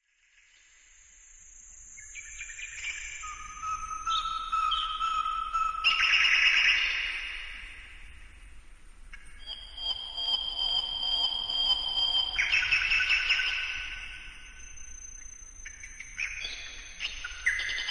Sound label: Bird Song